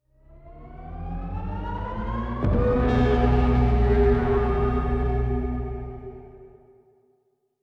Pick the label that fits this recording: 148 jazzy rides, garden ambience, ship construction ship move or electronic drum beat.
ship construction ship move